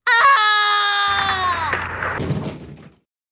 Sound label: AHHHC; Mobiclic; langues